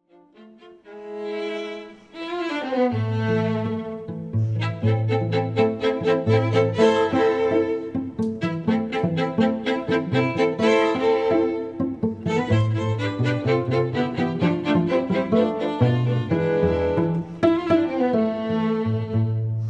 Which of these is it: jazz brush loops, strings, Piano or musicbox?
strings